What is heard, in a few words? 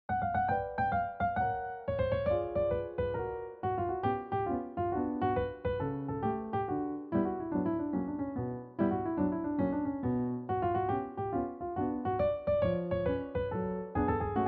tarantella; maikapar samuil moissejewitsch